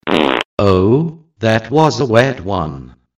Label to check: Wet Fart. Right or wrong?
Right